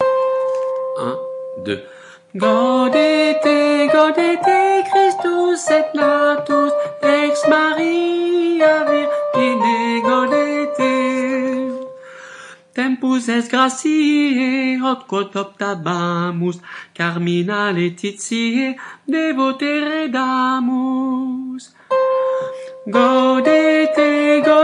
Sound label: Gaudete tenor, chorale